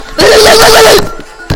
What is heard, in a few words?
u uh uj hahha